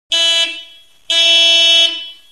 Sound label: car horn